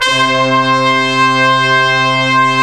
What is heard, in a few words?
MONO BRASS; HigherChoir Orch